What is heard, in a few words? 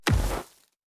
npc tree golem big projectile